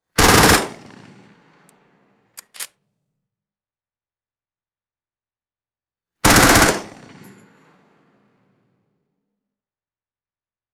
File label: m4 carbine short bursts firing; guns